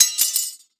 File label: Weapon Sword Drop On Concrete; AdobeCC